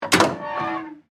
Metal Hatch Open, Scifi, Metal, Hatch, Open, Rocket, Ship, Door, Science, Fiction; Spacecraft